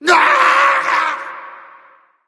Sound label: deathscream; fatality; smite; ra